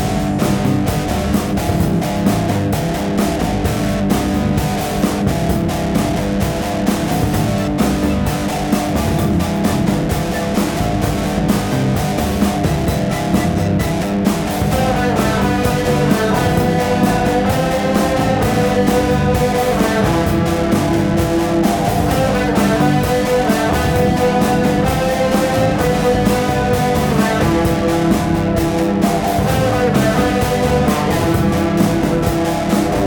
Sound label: happy guitar pop